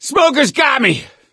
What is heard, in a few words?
choke, gambler, survivor voice; source engine; left 4 dead 2